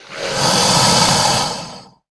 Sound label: worm striking poison